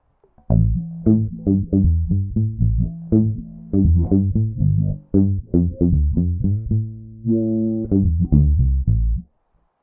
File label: Waldorf 2 Pole Bass Fast LFO Env Follower